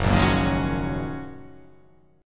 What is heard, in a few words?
Gb 6th Add 9th Gb down